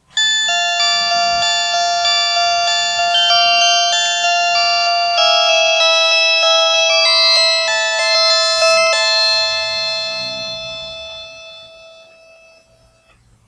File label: tone